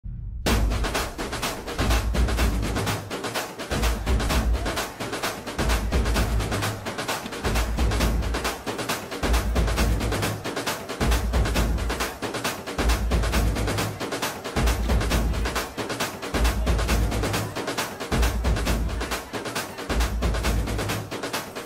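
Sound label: ending epic background music; rangasthalam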